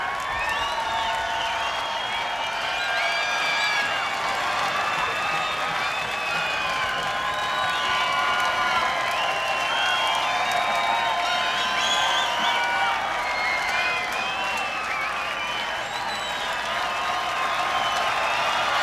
Crowd Ambience

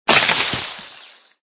rustle impact

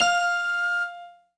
Ding; lego my world first steps

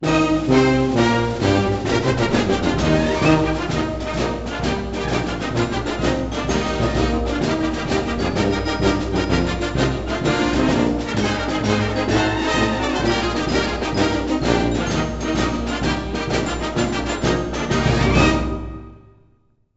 Fight Tune; NCAA March Madness 99; PS1

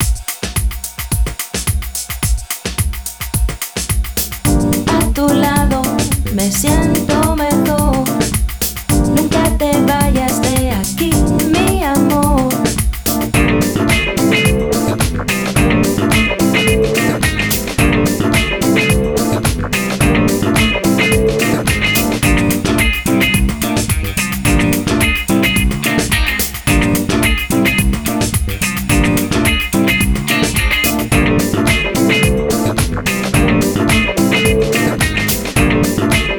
Lalala; Sound of the Human Tanks I

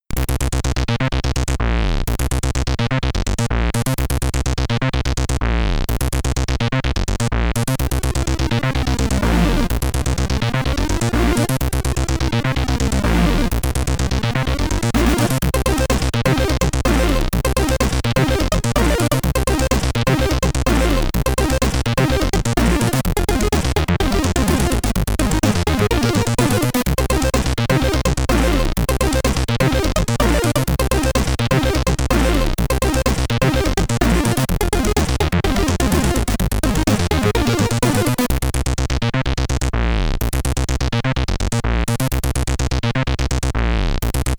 Main Menu (SID Stereo); Covergirl Strip Poker; Commodore 64

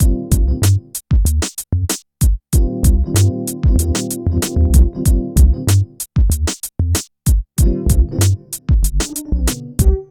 Network Result Lose

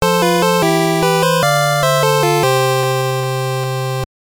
Jingle, soundtrack; Snoopy's Magic Show; GB

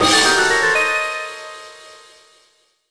Jingle PowerUp; Monster House

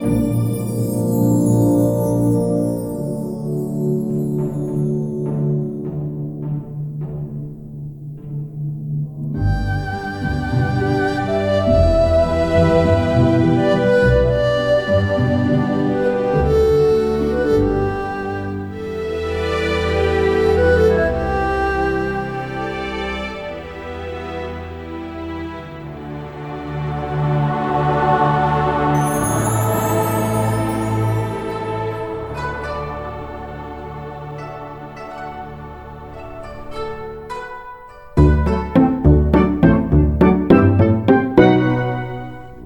LOOP side mission; Panda

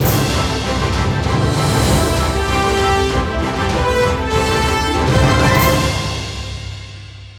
Battle Cleared (Banana Battles); Mario + Rabbids Kingdom Battle

